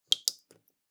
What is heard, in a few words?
Drips